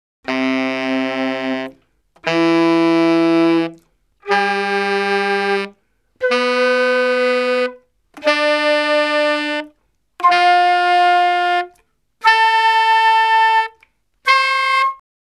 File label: Salsa Picante; Masterbits; World Zone VOL-3